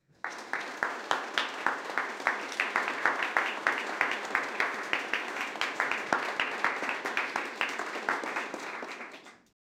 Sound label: tiny fruit applause